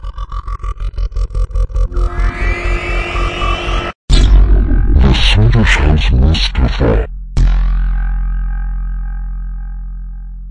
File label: jingle